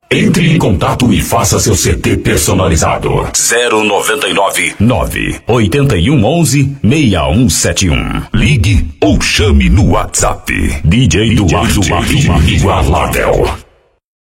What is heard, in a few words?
Dance Comercial